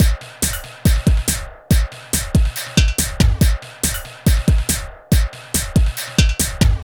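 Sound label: drum loops, Right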